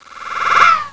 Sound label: t t twan